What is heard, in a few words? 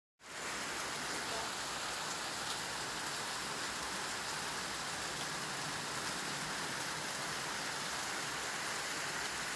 lake rain, Rain on the lake; Sound of the waves of the lake